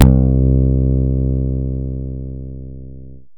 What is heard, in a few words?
Synths BASS